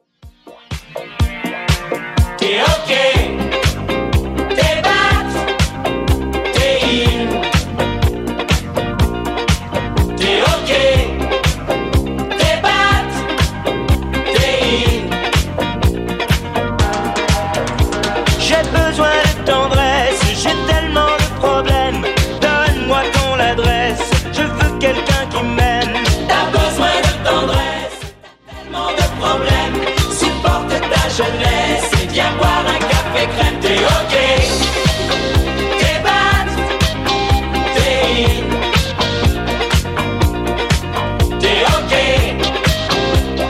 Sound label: 80s Redrum 123bpm